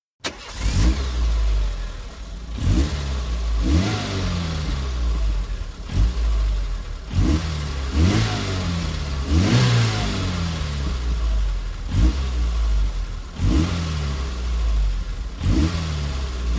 VEHICLES ASTON MONTEGO: EXT: Start, rev, switch off.